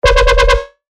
C, bass shots; Bears; revealed bass shots vol 3

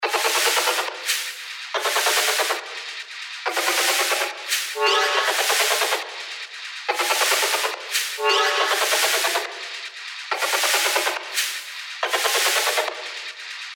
Noise FX Loop 140 BPM; Upstart; revealed fx loops vol 2

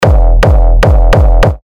Kick E; Megaton; terra kicks; Revealed Terra Kicks

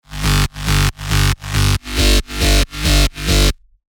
Reversed, techno bass; Spire; revealed spire techno bass vol 1

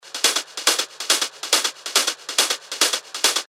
140 BPM, techno loops; Skibidi; Revealed Techno Top Loops Vol. 9

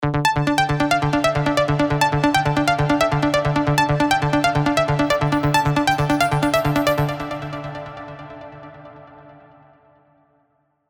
Ambient Swim, trance plucks; alonso spire trance plucks vol 3

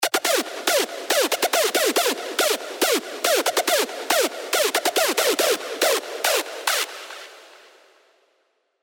screech; Genome Breach; Revealed Serum 2 Screech Pathogen

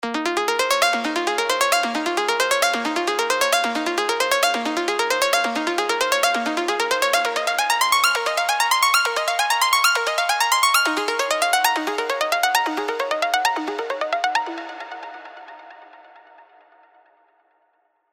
Bells; Mess Bells; alonso 19 year anniversary pack; Spire

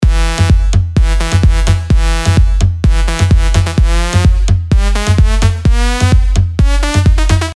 Sylenth1 dirty dutch leads; Rock The House; revealed sylenth1 dirty dutch leads vol 1

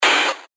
FX Hat, cymbals; revealed cymbals vol 1

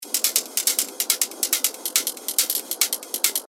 140 BPM, techno loops; Fortune; revealed techno top loops vol 8

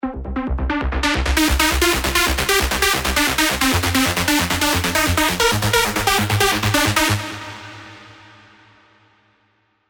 Tribal Rave; revealed spire mainstage drops

